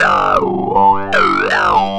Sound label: Throatline, Industrial Nasty